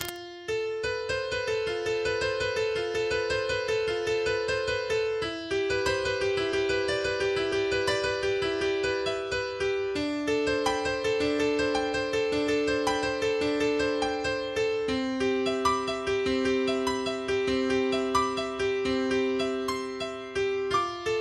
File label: Piano; Satoru Gojo